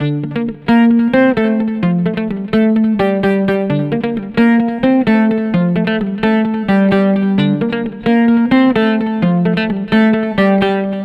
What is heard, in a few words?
guitar, stringed instruments; Sonic Foundry (Sony Creative Software)